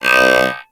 Gas City Puzzle Panel Activate; Warframe; Corpus Gas City; Gameplay Remaster; Ambience

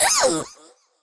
Girl Pain E; Warframe; Zariman; Skitter Girl

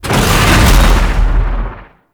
Void Dragon Chomp; Enemies; Abilities; Warframe; Duviri

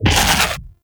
Fairy Dust Impact

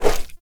Pool Noodle Whoosh

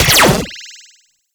Fire; Warframe; Weapons; Spectra

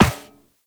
Walk Metal Perforated; footsteps; Warframe; Operator

